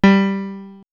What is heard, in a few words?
House Piano; X-Static Goldmine; backtothe70's